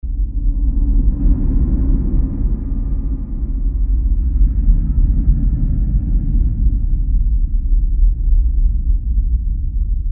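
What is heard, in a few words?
Ambience Dark Interior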